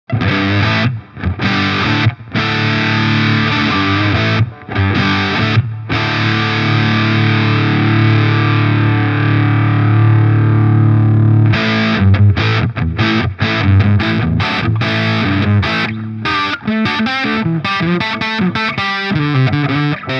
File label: Walrus Audio 385 Mode 2 Gain 11 h Treble 12h